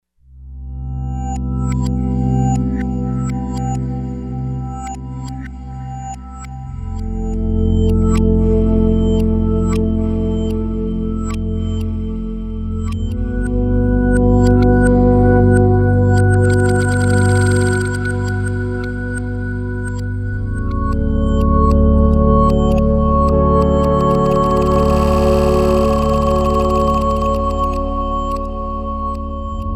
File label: Crystalize